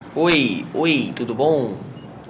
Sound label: lalala